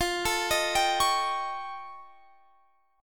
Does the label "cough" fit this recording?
No